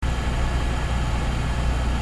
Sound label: fan